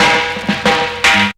drum rolls; Jungle

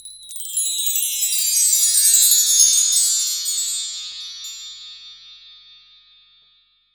Wind Chime; Zero-G Jungle Warfare 1, 2 & 3; 90 s Sample CDs

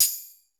ROOM P TAMB, ROOM KITS; Crypton - DrumBase5000

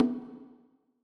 Conga, 140bpm, Grime; Zero-G Sound Sense Grime Scene; Construction Kits; Astoria

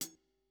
Kit, breakcore Drum Hits

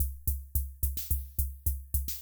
Kay R-12 Rhythmer Loop, Drum Machines

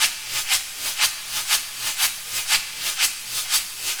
REVERB, SHAKR 120BPM; Bolder Sounds - Granular

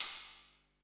PERC, Drum & Bass, 100 BPM; Ueberschall; Drum & Bass Resonance

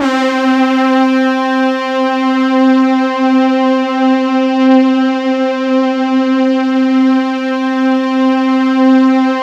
C4, Synth Line; 90 s Sample CDs; NiceBeats; SynthLine VOL-26

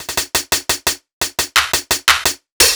Hi-Hat Clap Combo, Hi-Hat Loops; KJ Sawka - Drum n Bass & Beyond; Drum Tool Box

